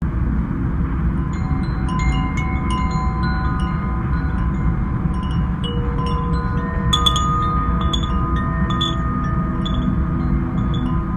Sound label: Purple Wind Chime; wind chimes purple for fall